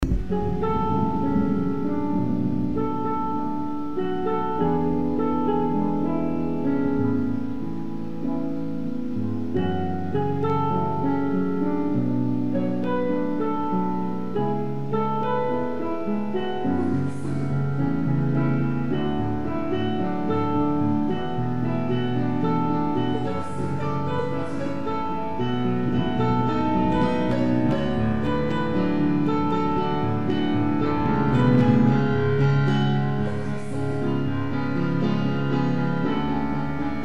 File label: Piano; D.N.Angel